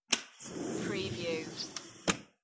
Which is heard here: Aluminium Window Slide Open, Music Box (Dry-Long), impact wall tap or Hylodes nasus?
Aluminium Window Slide Open